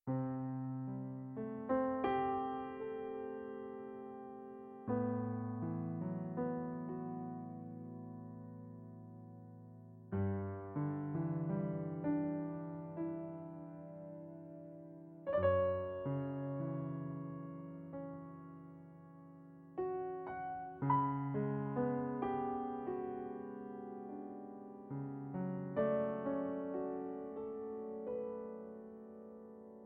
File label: piano improvisation